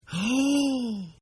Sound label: Gasp; Gasping; Sound Effects:Voice; Partners In Rhyme